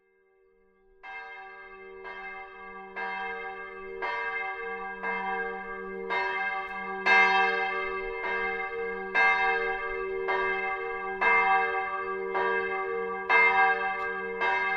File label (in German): glocke, Dobbertin